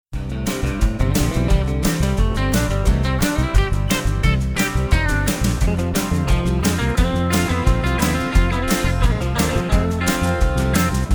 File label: Western rock band